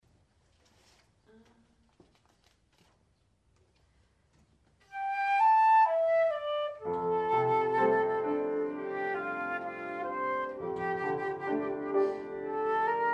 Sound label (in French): prelude et rag; pascal proust